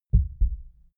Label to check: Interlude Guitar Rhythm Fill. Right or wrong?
Wrong